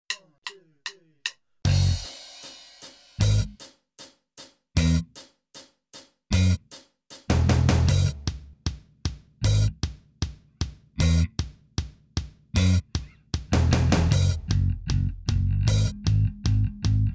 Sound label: Bass track 154bpm; Bridge